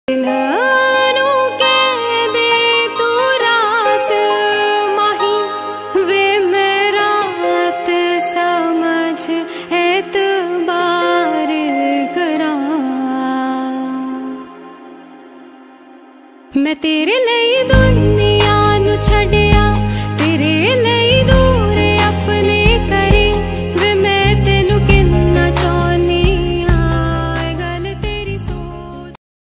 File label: Female Version punjabi ringtone